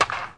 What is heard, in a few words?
bat soft; Hardball 6